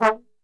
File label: TRB SHT, Brass Super Section, TRMB SHOT; Best Service